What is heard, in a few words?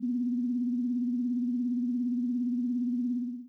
Retro Spaceship